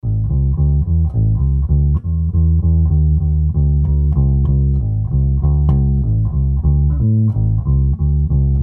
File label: Bass Loop